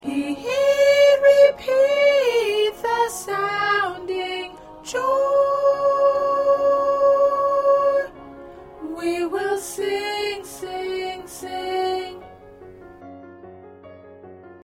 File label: Soprano, choir